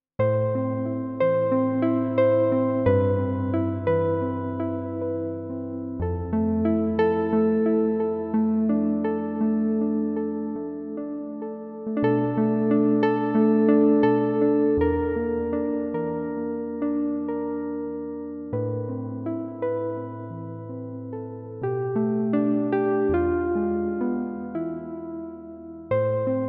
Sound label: Urban Harp; VIVO S10